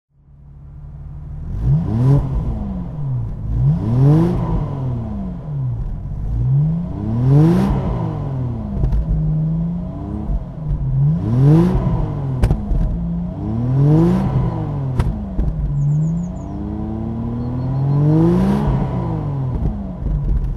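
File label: jaguar XJS V12 throttle, Engine sound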